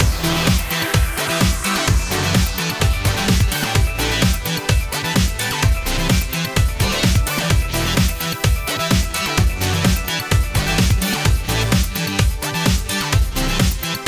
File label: Race Victory; License Test Victory; GT Racing Motor Academy